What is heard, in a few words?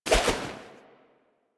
Fishing Spear Charge; Warframe; Weapons; Eidolon Fishing Spears